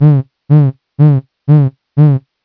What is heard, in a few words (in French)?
Impulsions glottales